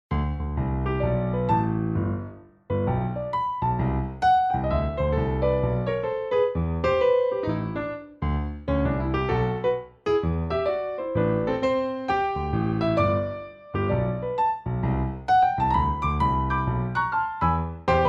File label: jazz piano; Samsung Galaxy Z Fold 3; ringtones; SoundTheme Fun; The Voyage